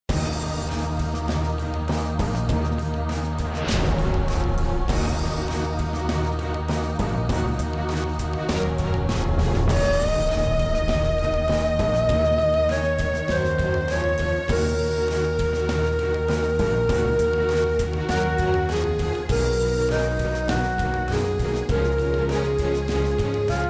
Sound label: bgm